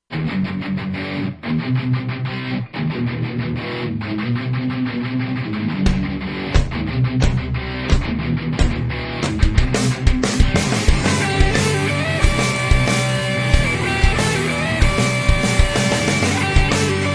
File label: Bass Guitar